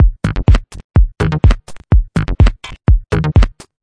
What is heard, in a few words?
125 bpm bitcrushed minimal, drum loops and breaks